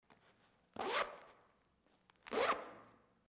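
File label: zipper fastener; zastezhka molnija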